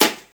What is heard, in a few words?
smooth acoustic snare sound g sharp key, Royality free snare one shot tuned to the G# note; snare drum